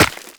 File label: Run Rock, Footsteps; Warframe; Operator